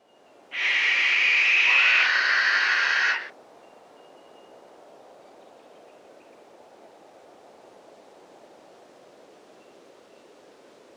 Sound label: Masked owl adult screeches